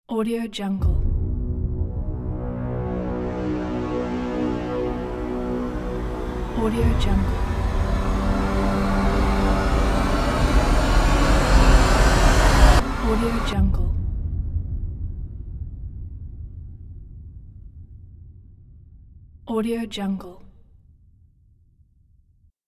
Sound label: cinematic horns logo